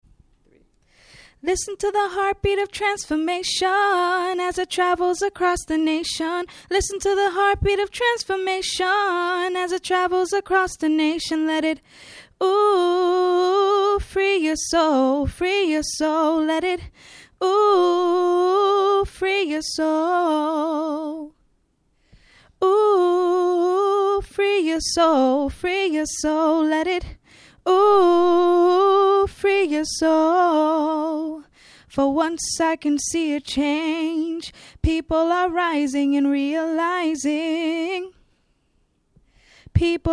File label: heartbeat; Voice solo